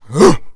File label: Melee Grunt, Human Cop; zps; Humans; MeleeGrunts